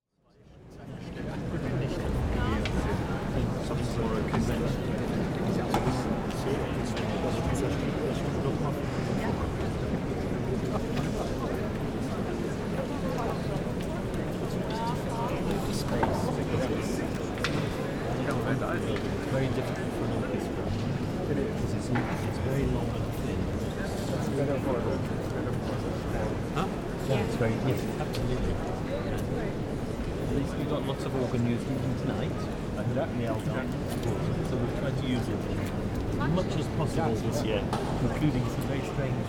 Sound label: Bavarian audience